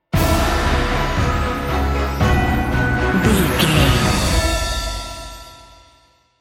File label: cinematic corporate sting bumper